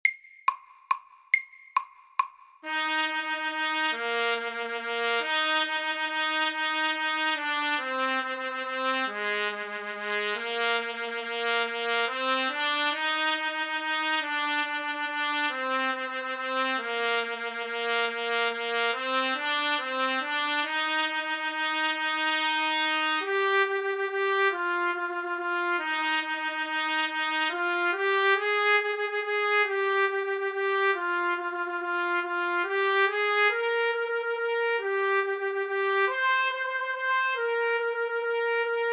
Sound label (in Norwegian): Trippeltunge etyde SAKTE